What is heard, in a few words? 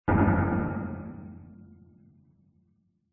impacts metal thud clunk heavy solid designed